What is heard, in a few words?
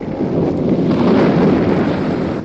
avalanche; a piece of flake